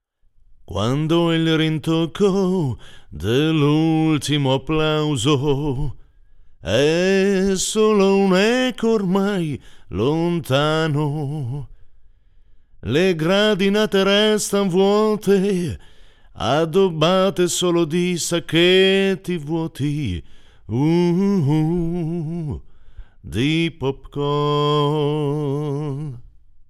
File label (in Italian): canto pre valvolare; Amplificatore